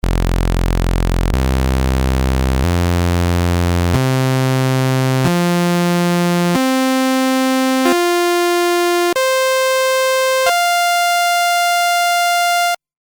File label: Juno saw